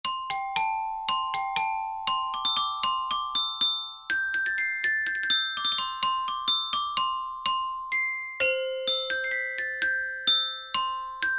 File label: Glocken, Tuned Percussion; Yamaha A4000; Brass - Wind Instruments